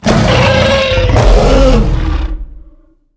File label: rancor dead; Star Wars Knights Of The Old Republic I; XBOX